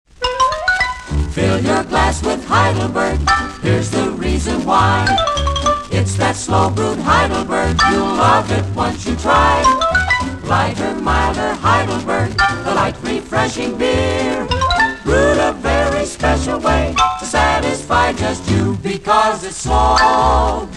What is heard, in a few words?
Slow Brewed Jingle; Heidelberg Beer